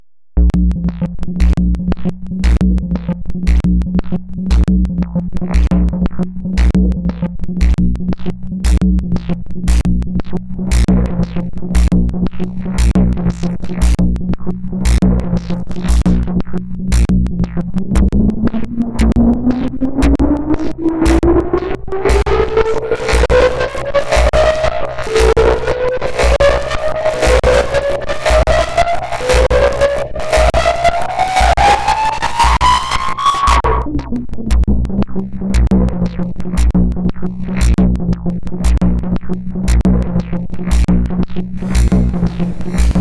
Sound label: stupid upleasant sounds with an old pattern and the Impulse machine; doing very stupid upleasant sounds with an old pattern and the Impulse machine